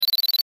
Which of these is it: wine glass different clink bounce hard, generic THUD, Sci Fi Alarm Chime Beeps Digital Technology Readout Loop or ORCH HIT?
Sci Fi Alarm Chime Beeps Digital Technology Readout Loop